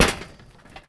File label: grate, Players